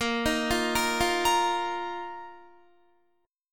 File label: A sharp Suspended 4th Guitar; A#sus4 arpeggiated; 18,18,15,15,17,17